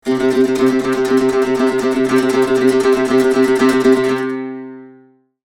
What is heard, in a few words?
Dulcimer tremolo long